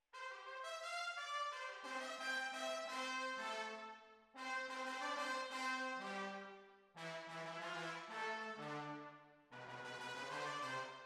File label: Hall